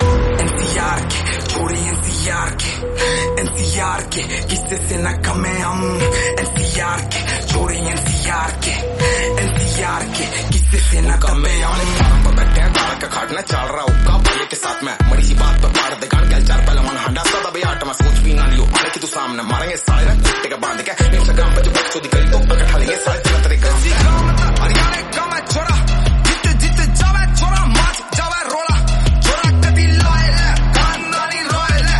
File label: haryanvi song